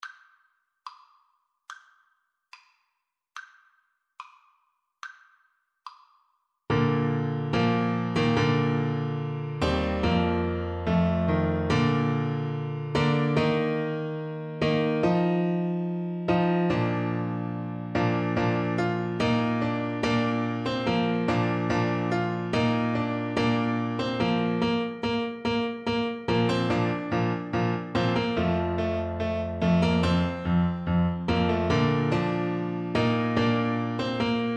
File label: Oboe